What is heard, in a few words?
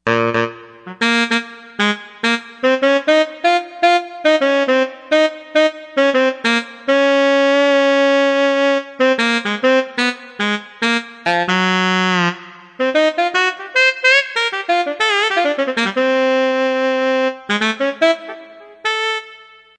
Saxophone, Yamaha TG55; Factory Presets